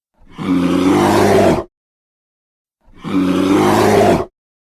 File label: grizzly